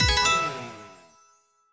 High Symbol Wins; Victory Lane

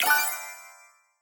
SFX Reveal Picked Number; slots; Panda Majesty2

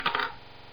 ball Bounce, Roulette

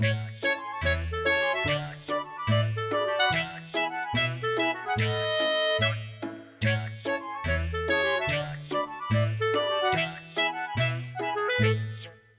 Bonus Game, slots; Gumball Surprise; Sugar Town

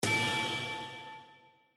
Show Chest; slots; Realm Saga

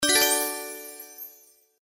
Regular Symbol Win; slots; Pirate Quest

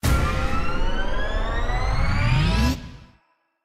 ANTICIPATION BONUS; Zodiac Millionaire

